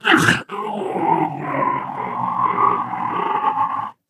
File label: pain wall crash; player